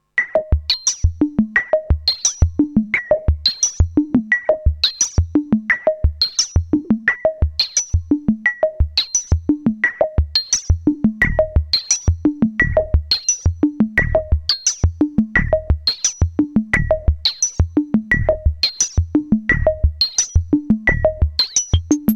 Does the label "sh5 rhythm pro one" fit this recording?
Yes